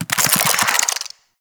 Infested Big Ashen Melee End; Warframe

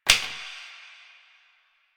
Plastic Broom Drop

